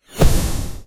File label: big smooth explosion of s, shimmering particles